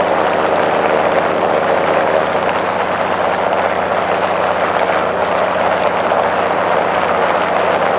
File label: engine plane far; engines; War Thunder